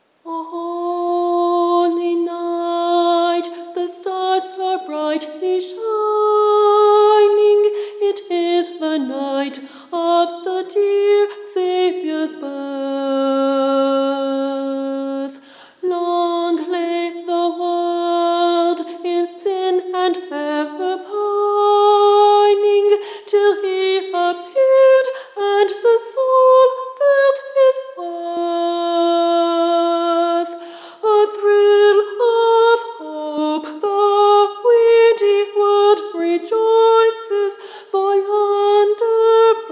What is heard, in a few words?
Soprano II